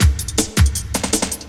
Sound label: LOOP, Drum & Bass, 160 BPM